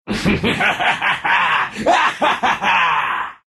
laugh, ru; Clinkz; dota2

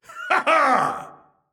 grunt physical; Realm Royale; Warrior; Archangel

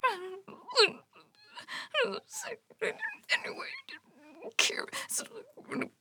Grumble; Skye

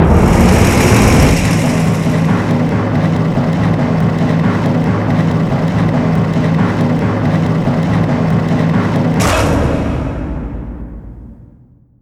Rolling Thunder; Dota 2

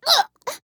Grunt Hit; Ying; Paladins

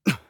Warrior Grunt Physical; Realm Royale

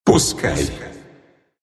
move pain, ru; Dota 2; Monkey; crown

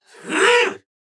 Grunt Attack; BombKing

